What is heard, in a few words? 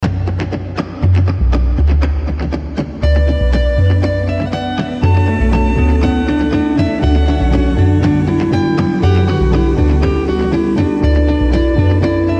instrument, آهنگ های مخصوص آسانسور بیکلام